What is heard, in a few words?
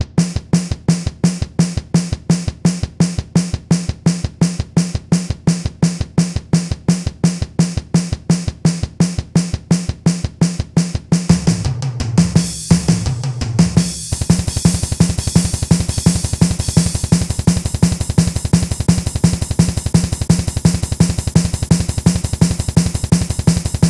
first attempt at a steel wolf beat